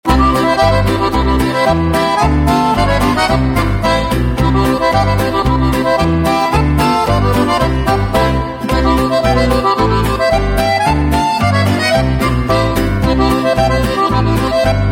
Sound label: chicken polka, accordions